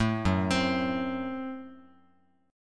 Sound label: F sharp Diminished F sharp, Guitar; arpeggiated; 0,2,3,x,x,x; 4A,4E,5A,5D,5G#,6C#